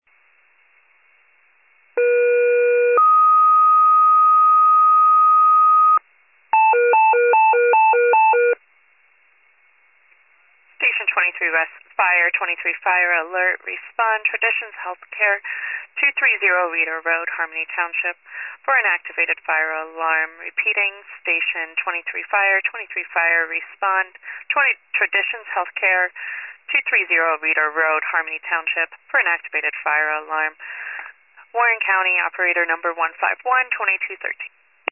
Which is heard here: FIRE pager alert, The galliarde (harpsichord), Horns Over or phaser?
FIRE pager alert